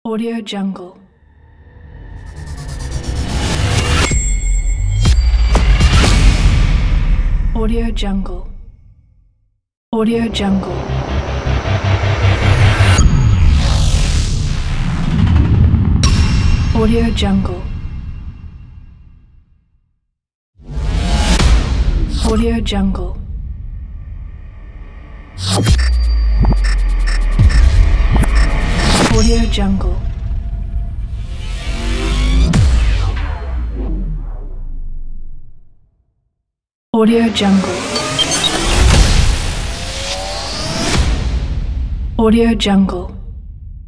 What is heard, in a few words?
transformer logo pack